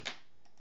Clack

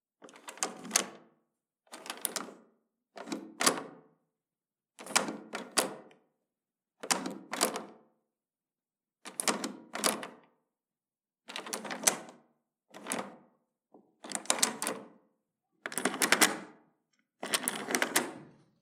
Opening The Door Unlock

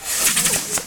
Fireworks Twirl

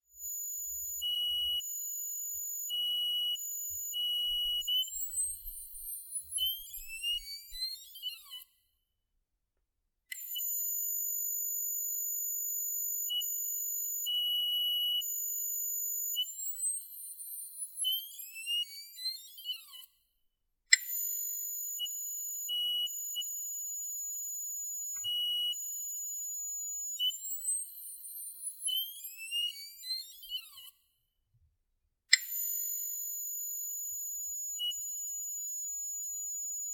Transformer

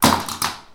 Dropped My Glasses

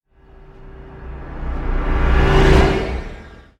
Cinematic Raising Phased Whoosh

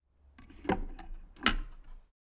Record Being Placed On A Record Player

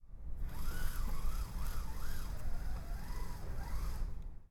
Blind

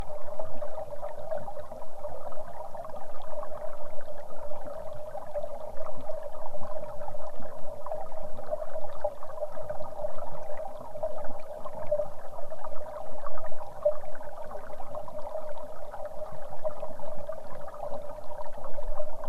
Hydrophone Underwater Flow

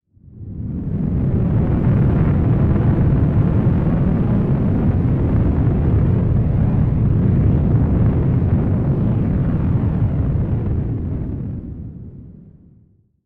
Comet Asteroid Or Rocket

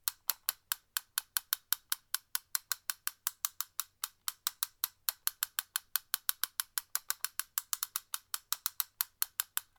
Toy Ratchet Spin Slow

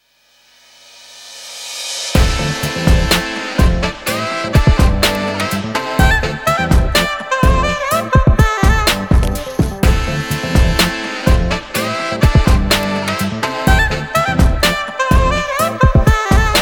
Experimental Sax Short Version

